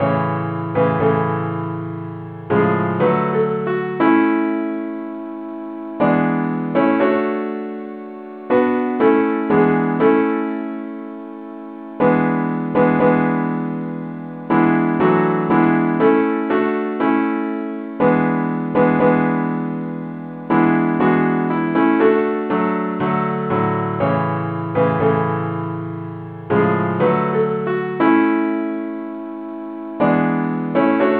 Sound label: simple acc key a